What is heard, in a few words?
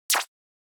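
kasket snake attack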